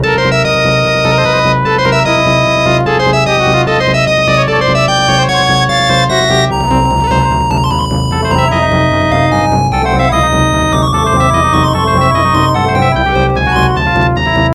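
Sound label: background music; Forgotten Worlds; Capcom Classics Collection Remixed; PSP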